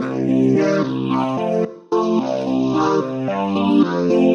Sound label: PolyCoder C; Leeds Algorave 2016